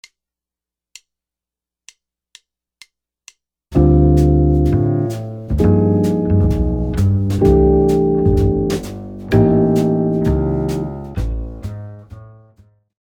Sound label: jazz guitar comping